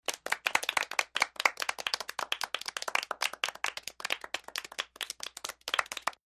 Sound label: 20 People Clap